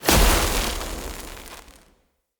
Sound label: Hunter Sniper Shot Projectile Burst